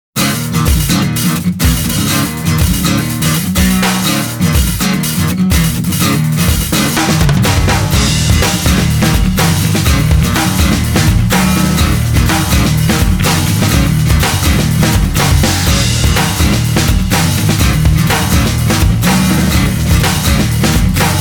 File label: Leader Acoustic, Guitar; Parental Advisory Explicit Guitar